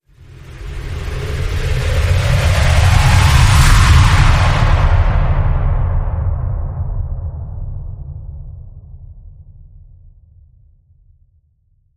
Feel The Wind Transition

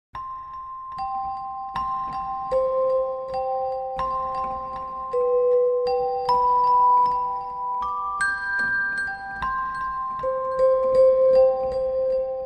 Bells Only

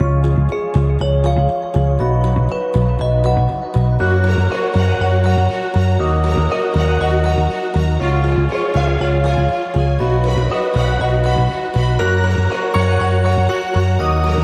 Joyful And Inspiring, No Drums

